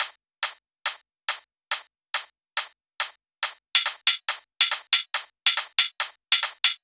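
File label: drum Loop 140BPM hi-hat; Vengeance - Essential Clubsounds Vol.5; VEC5 Drumloops; VEC5 Multi Loops 140BPM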